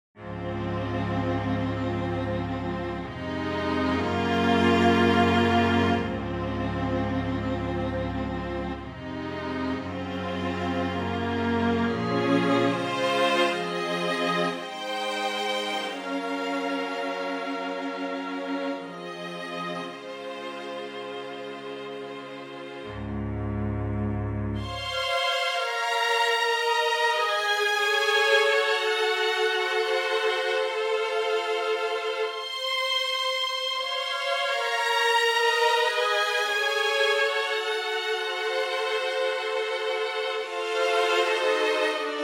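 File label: vintage strings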